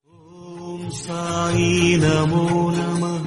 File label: Om Sai Namo Namah Ringtone; Sai Baba